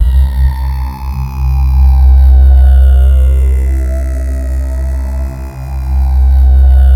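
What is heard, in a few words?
C2, atmosphere; Sound Gallery - Charged; JD-ATMO 1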